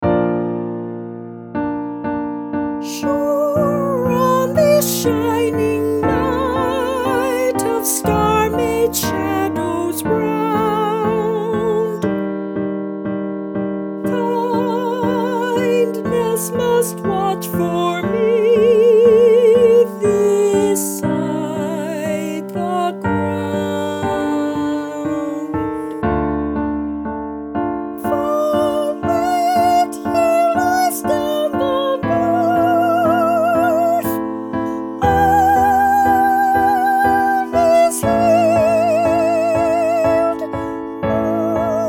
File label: Soprano